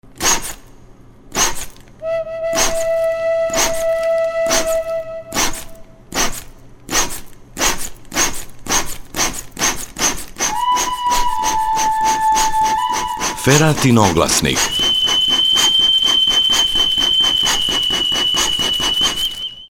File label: jingle; feratin oglasnik